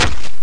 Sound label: axe hit bod; weapons